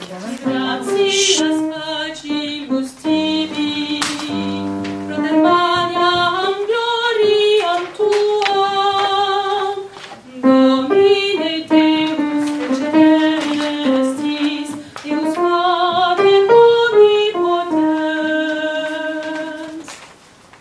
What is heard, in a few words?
alto; gloria